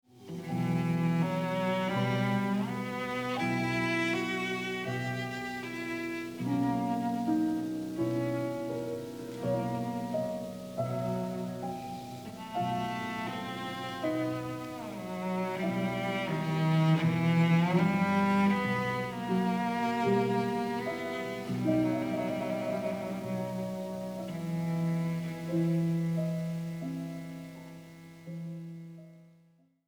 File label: Sonata for Cello & Piano in G; III. Andante; J.S. Bach